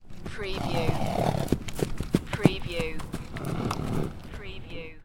Horse trotting up agitated

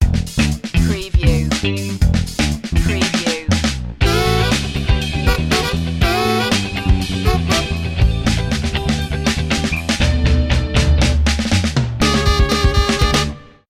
funky feet stinger

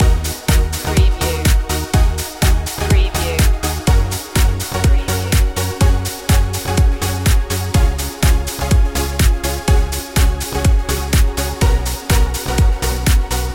ibiza feeling loop

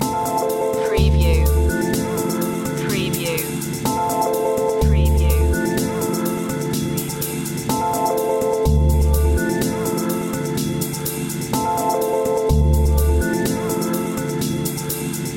disco lights loop

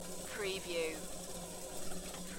Kettle bubble loop; beanstalk audio

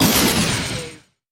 Hit Descend

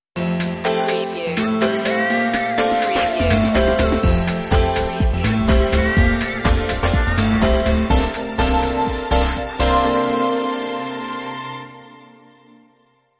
pop vibez vibes

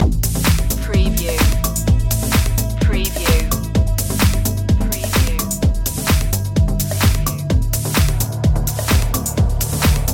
progressive boy loop

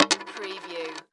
Coin Set Down Table

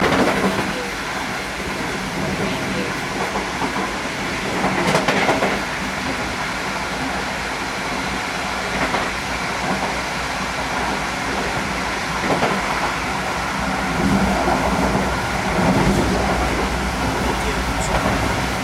between train wagons

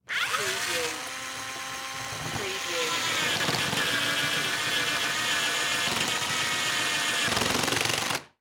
Cordless Drill Wood

